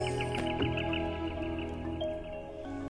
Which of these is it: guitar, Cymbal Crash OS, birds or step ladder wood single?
birds